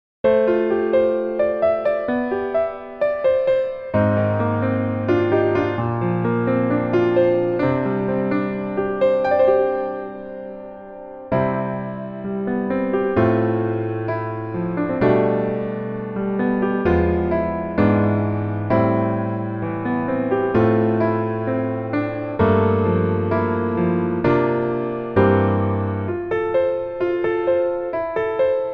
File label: Piano ver